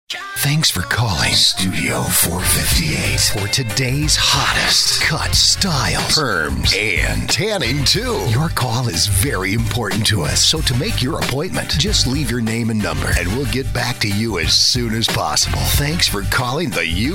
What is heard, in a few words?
on hold